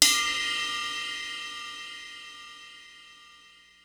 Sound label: Ride Bell, Ensoniq ASR-X Pro